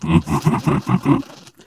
ballbot devious laugh; player; Portal 2

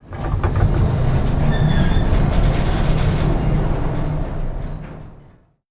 move oneshot, elevator apartment

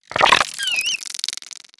idle, headcrab black, creatures; vox

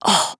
death fem, player

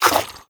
breath out, headcrab black; creatures

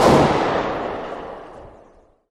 ak47 distant; weapons; Counter Strike Model Pack; CS:GO

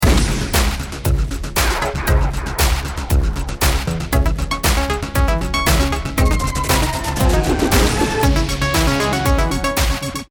bomb ten sec count

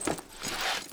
shield equip; Counter Strike Model Pack; CS:GO; survival

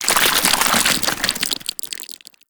vomit splash, barnacle creatures; Half Life Alyx

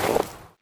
snow footsteps; player

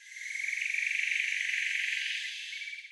shoots jungle bird

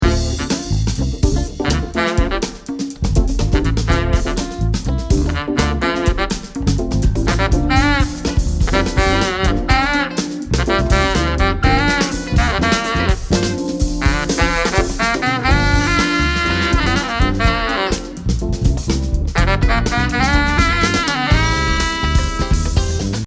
start round, music

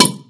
wood solid impact hard; physics

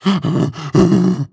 breath long, zombie

